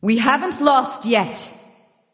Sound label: health low; smite; voicepack; nike